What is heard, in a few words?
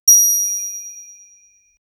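videogame coin pickup; gameplay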